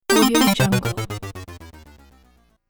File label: 8bit gaming fail big